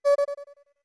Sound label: Voice D2 short; OOT